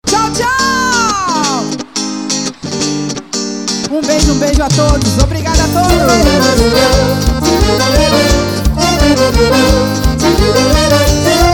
frevo

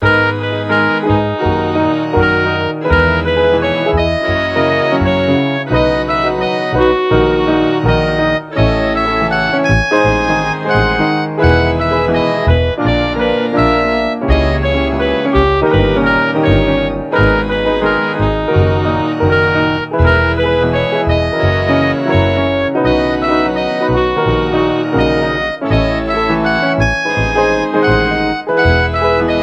orquestra

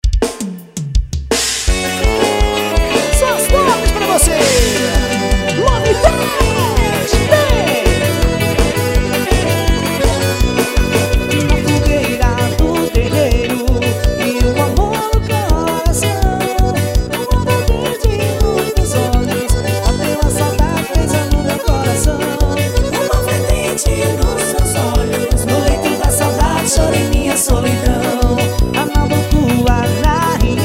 arrastape, forro ao vivo

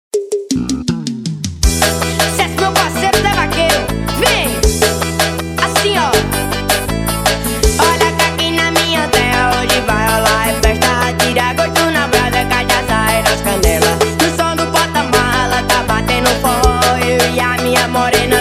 tome forro

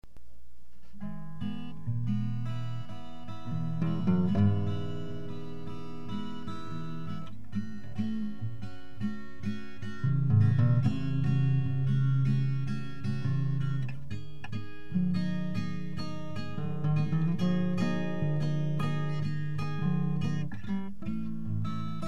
solo em mi maior

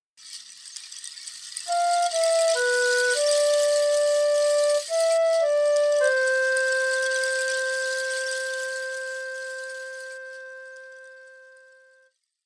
pau de chuva flute; trilhasparafilmes

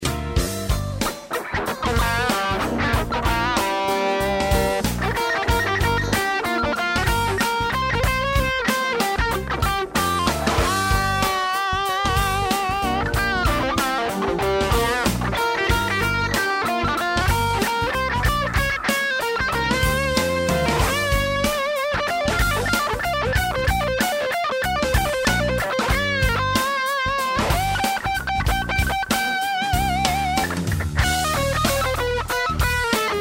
fusion improviso

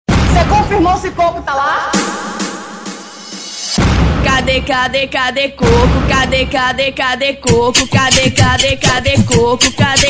funk

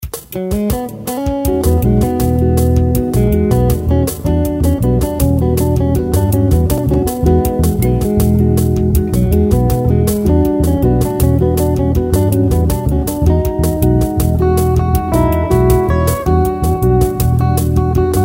samba solto

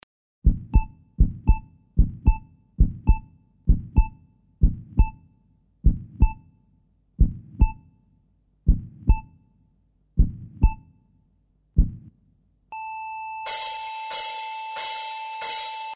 pulso

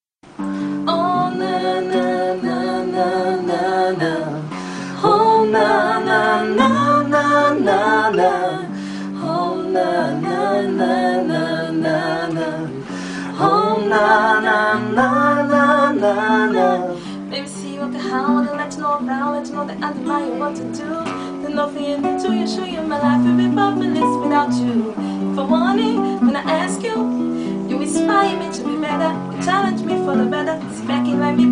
voz violao

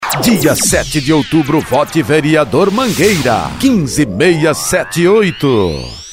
vinheta; do vereador mangueira

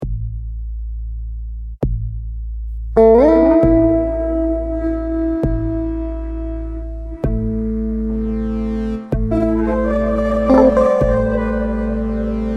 o lado azul da melancia instrumental

